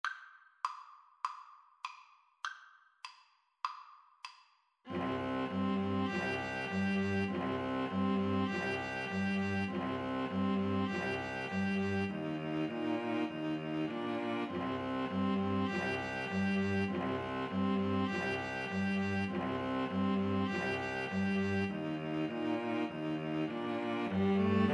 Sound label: mountain king, String trio